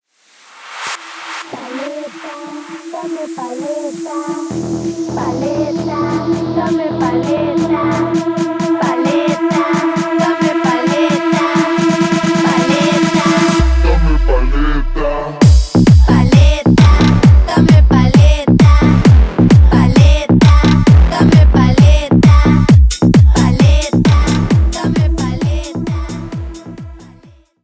intro outro 132 bpm

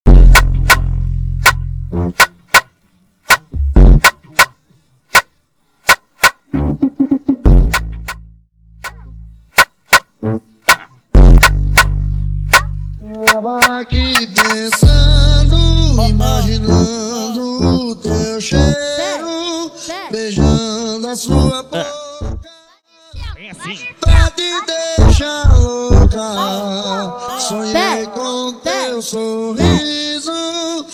Funk Brasilero 130 BPM

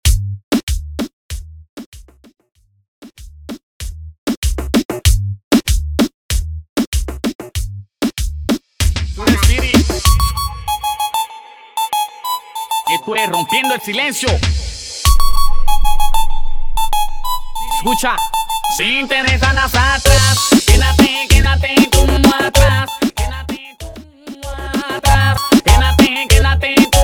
Intro Outro 96 Bpm

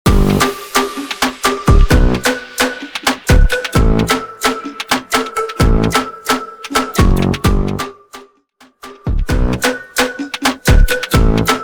Funk Brasilero Intro Outro 130 BPM; Prodeejay Remix

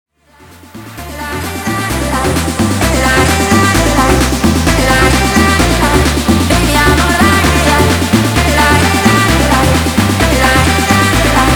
guaracha remix 130 bpm

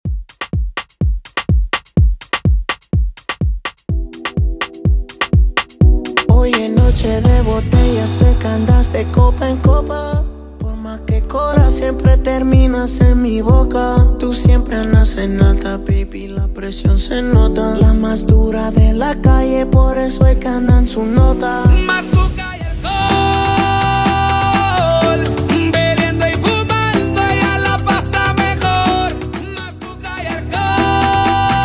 Intro Outro 125BPM

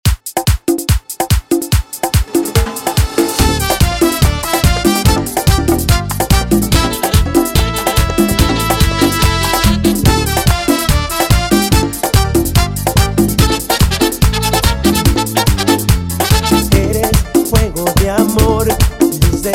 144 bpm

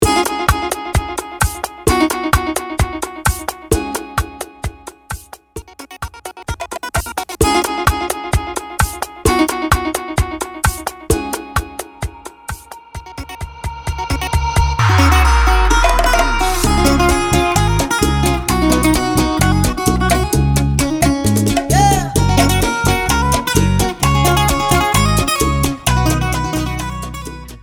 Intro Outro Break 130 BPM

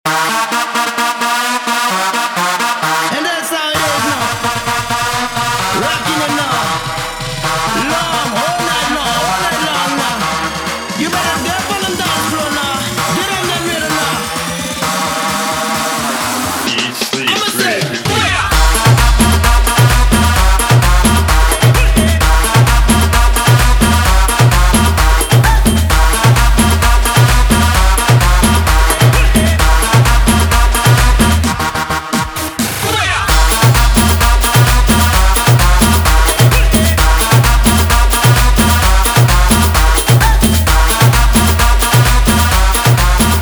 guaracha remix 130 bpm

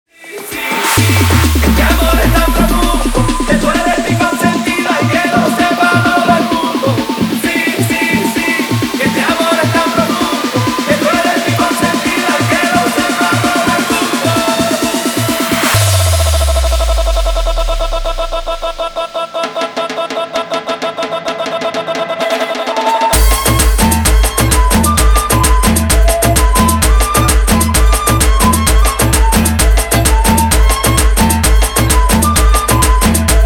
guaracha remix 130 bpm; Reggaeton dj remixes; Prodeejay Remix

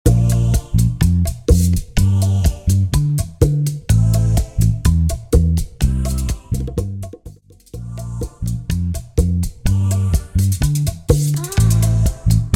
Bachata 125BPM; Bachata Project; bachata bootleg remix

